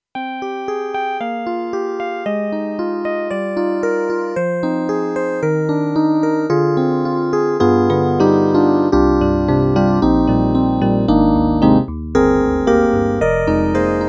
CASIO GZ-70SP ELEC PIANO

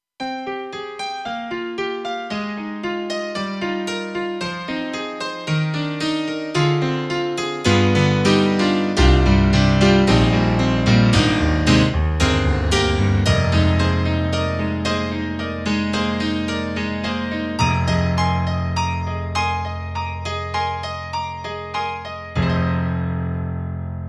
KORG Power Piano, TRITON-Rack; EXB-PCM08

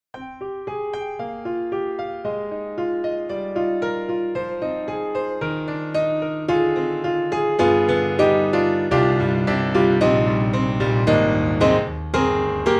Grand Pianos, Studio 7ft, Close C7 Club; Synthogy; IvoryII

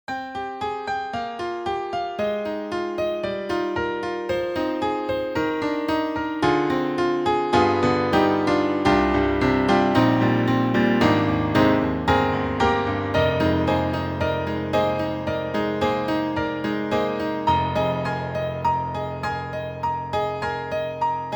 Steinway Piano; SoundFont2

